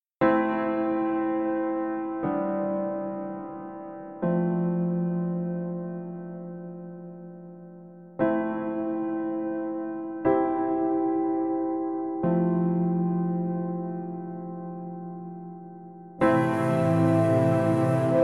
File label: melancholy